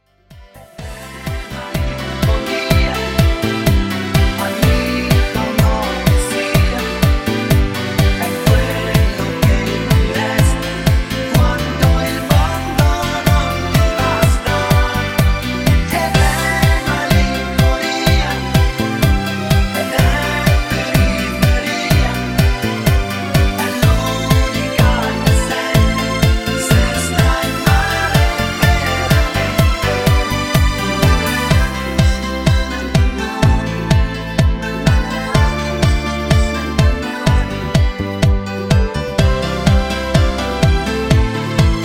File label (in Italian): Cha Cha Cha Ballo Di Gruppo Canto